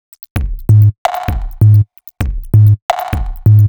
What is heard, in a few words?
Mice 130bpm, House Drumloops; Zero-G House Fabrik Drumloops; ZG Drumloops